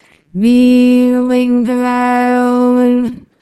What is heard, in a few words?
singing